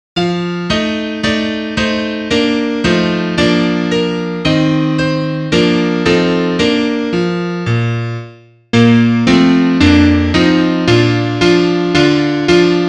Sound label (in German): Klavier; Liederbuch; brunn gefallen